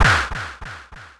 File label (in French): tombe